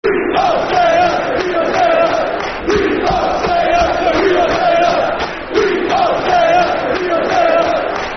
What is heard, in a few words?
Football Chant, Soccer Songs; We Are Staying Up; Cheltenham Town Ctfc